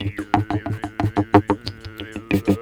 KHOMUS; USB Soundscan vol.60 - Asian Traditions; AKAI

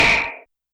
Snare Synth, YAMAHA RM1X

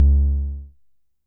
tom, Coron Drum Synce DS-7

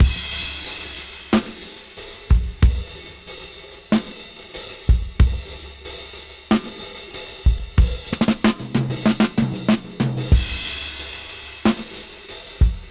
Half Time Slow 94 BPM; AMG - Keith LeBlanc Phat Butt Jams

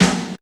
Snare, Drum One Shots

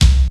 KICK, DRUMS; Crypton - DrumBase5000

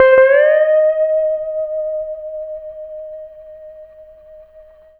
Lap Steel, Trip-Hop Loops; Sonic Foundry (Sony Creative Software); Rondo Brothers Trip-Hop Loops & Samples; Hard Rocks The Roof

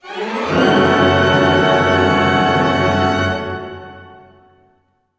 GLIS F# minor, gliss minor, orchestra; Sound On Sound - Peter Siedleczek's Orchestra

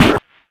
Oneshot

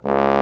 TROM C#2; Proteus3

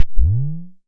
Perc - Bend Up; Tama TS-206

